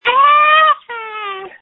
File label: screams